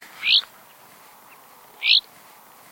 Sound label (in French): alouette calandrelle